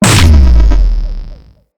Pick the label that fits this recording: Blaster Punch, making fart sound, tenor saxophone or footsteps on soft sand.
Blaster Punch